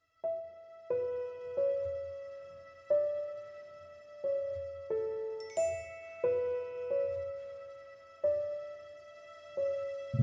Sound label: background music